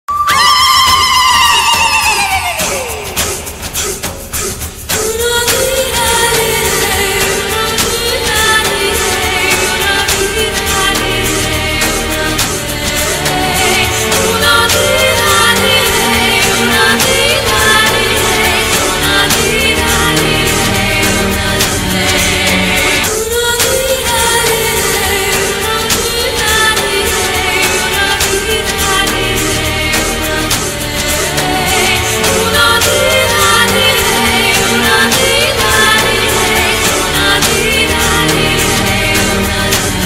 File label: horror ringtones horror music horror sound effects; kaal; jangli ringtone